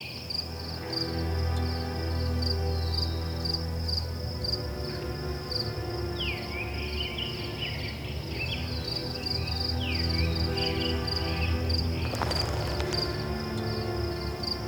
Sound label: ambiance habitat daytime; the dranik; ivan duch music packs